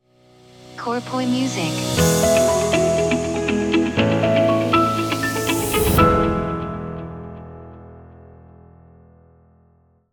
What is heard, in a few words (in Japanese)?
jingle ウォーター